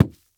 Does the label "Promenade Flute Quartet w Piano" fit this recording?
No